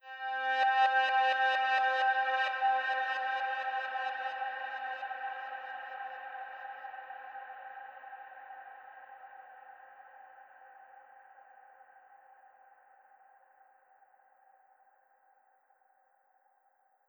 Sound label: Loop Win C